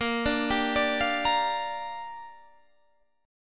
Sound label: A sharp 6th, Guitar Drop D